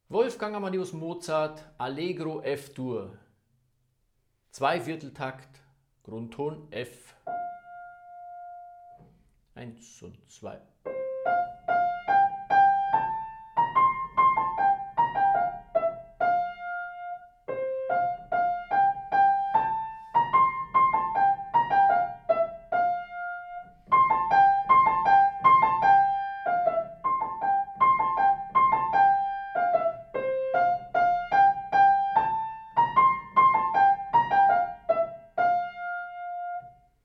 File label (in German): Allegro F Dur, Klavier; Mozart